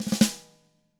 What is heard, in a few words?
RUFF, SNARE; SNARE ROLLS; ILIO; Double Platinum Drums 1